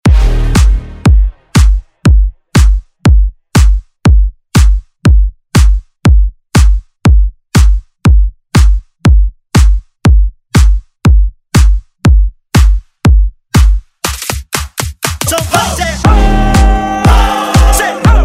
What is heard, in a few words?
Dance